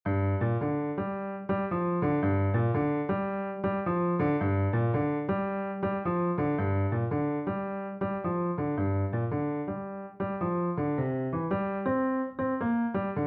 Beginner Piano Accompaniment 110 BPM

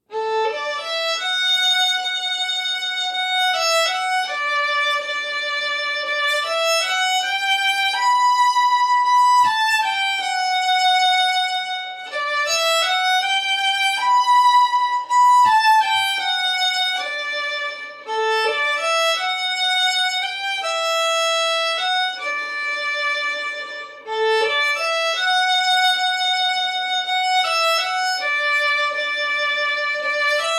Beginner Violin Solo 80 BPM

